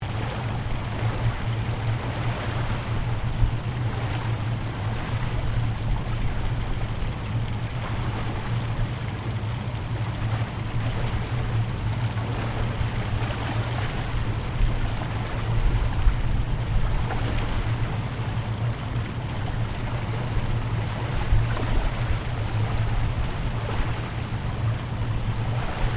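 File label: Boat Custom Restaurant Boat Large Engine Wash; Resteraunt; Boats (Sound Effects:Vehicles)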